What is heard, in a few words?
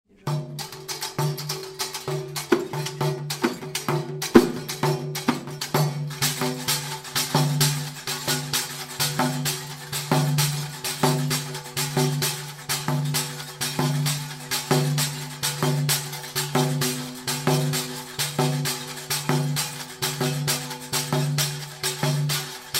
Riqq